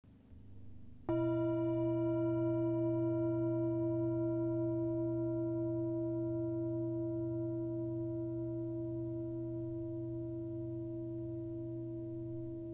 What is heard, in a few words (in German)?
Antikschale